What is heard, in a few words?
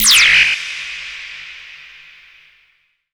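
synth, Production Effects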